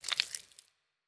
Servant move Bones

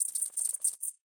Env Void Grasshopper

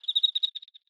Env Void Insect Sing

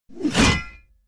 axe attack full; unsighted

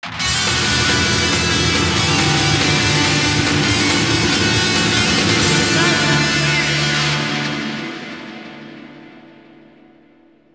Str G#

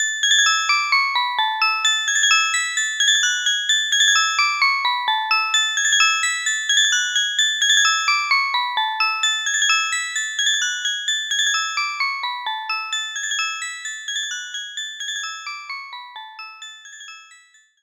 Tower Moving

